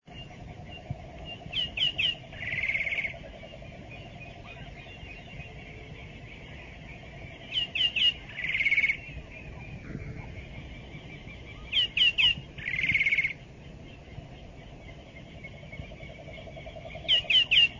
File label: Saltators, پرنده پایکوب